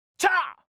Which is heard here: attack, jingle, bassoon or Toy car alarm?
attack